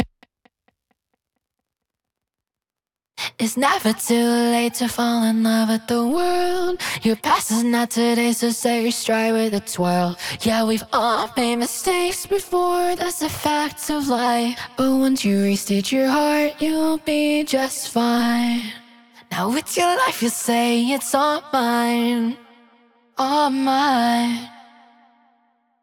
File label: motif voc